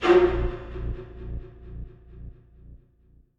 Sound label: mus sting string, Minecraft Story Mode soundtrack; A Portal To Mystery